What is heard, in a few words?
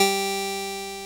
ORG VOX G, Continental; Roland LCDP09 Keys of the 60s and 70s 1